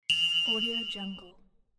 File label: new mail